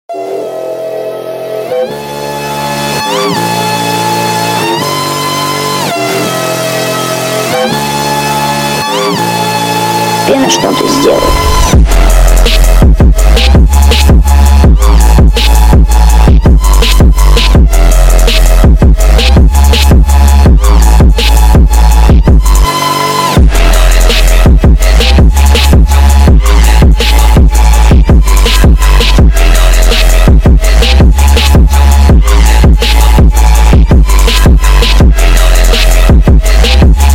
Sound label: phonk